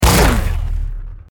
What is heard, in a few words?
hit; csgo